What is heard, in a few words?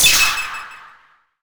death